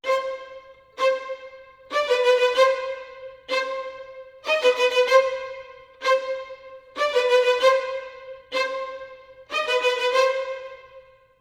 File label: 95 c min strings hi, Orchestral arrangements